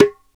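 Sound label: perc darabuka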